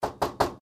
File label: knock on door